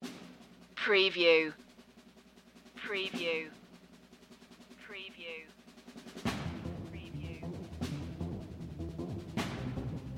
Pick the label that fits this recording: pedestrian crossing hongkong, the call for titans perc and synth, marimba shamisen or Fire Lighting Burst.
the call for titans perc and synth